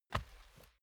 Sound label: step barefoot wood plank single right; schritte